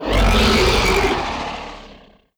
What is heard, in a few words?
NPC Killed